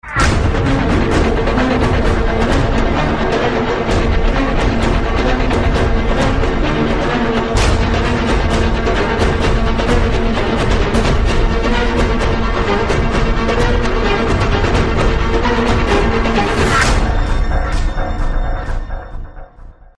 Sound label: War Film Ringtone